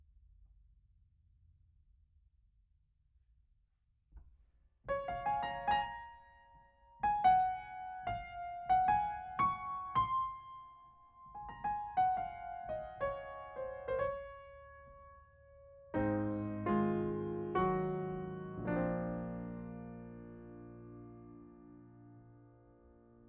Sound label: Piano Mono